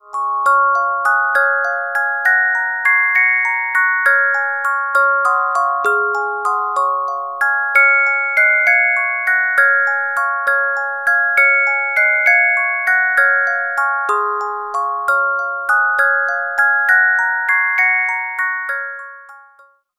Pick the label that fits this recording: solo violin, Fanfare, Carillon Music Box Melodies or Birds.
Carillon Music Box Melodies